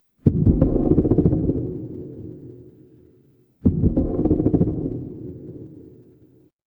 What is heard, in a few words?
horror creak